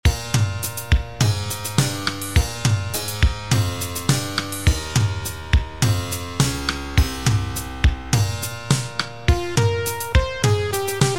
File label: short sci fi suite